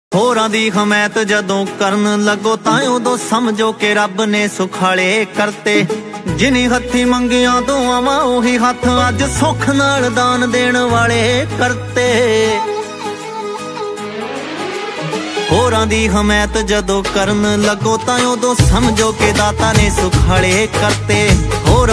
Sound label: Punjabi Song Ringtone